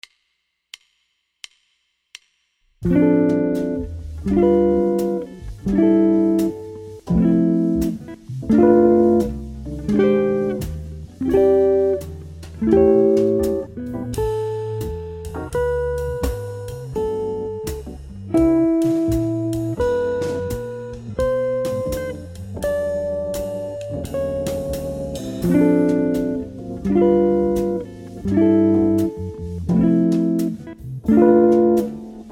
voicing f

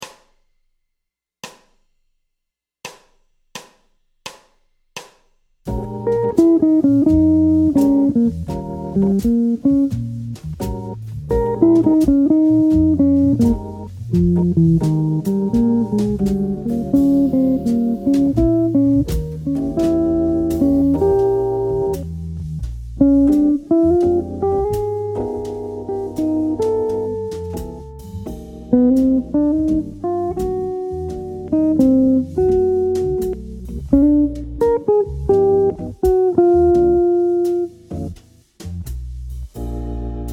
Blues lick; jazz blues

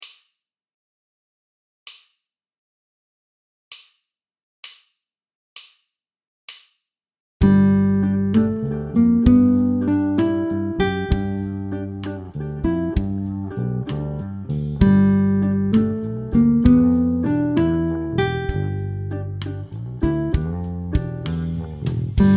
riff, guitare jazz

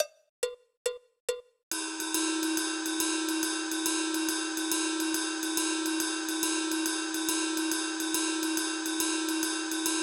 shuffle ride

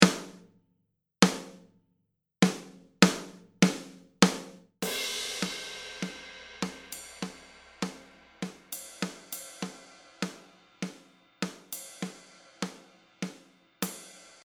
rythm combo, guitare jazz

